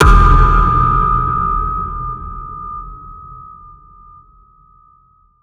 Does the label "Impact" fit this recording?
Yes